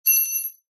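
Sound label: industrial aluminium key drop concrete floor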